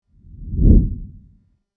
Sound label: push